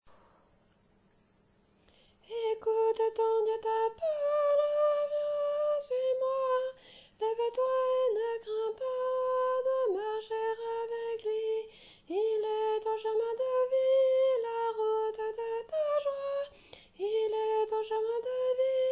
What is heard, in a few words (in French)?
Voix de ténor; écoute ton Dieu